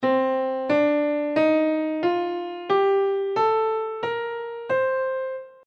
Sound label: dorian mode